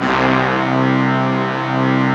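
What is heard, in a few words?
Brass Ensemble, Roland; Club-50 - Foundations Roland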